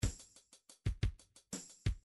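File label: drums blues rock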